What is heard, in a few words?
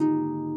harp